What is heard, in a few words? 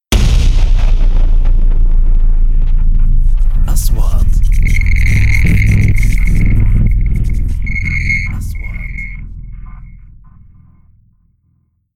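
3D SFX Trailer; مؤثرات تشويقية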